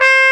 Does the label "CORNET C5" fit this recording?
Yes